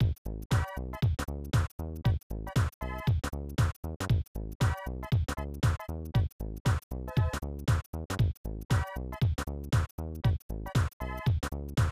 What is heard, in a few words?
Game Music; NBA Hang Time; SNES